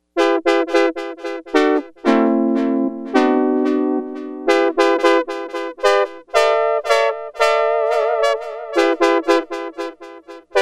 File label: Synth Brass, Prophet-5; Sequential Circuits Prophet-5 (Ver.3)